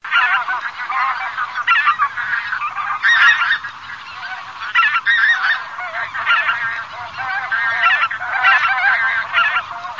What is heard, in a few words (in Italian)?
OCA GRANAIOLA